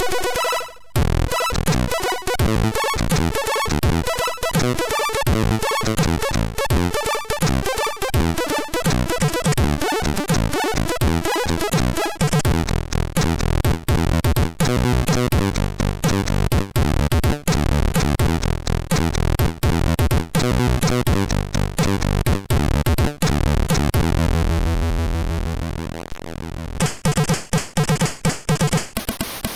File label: Arcade, sid MOS6581R2; Commando